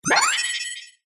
science fiction cyberpunk equipment activate ascending beep; zapsplat